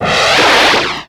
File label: reverse; Techno Trance Essentials; FX & ATMO